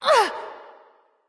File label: grunt hit; smite; nemesis